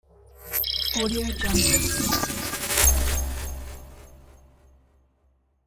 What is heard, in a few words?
intro logo glitch